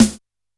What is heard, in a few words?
Snare H Hard, Standard Kit, Yamaha PSR-E323